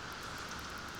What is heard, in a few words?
Background Noise